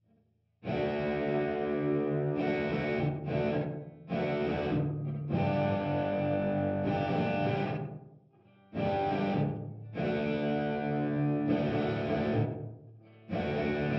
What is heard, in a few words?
TC8210 Party Next Door